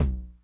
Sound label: Tom Low Accent Low Tone Mid Decay, 909